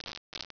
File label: CHAIN; WEAPONS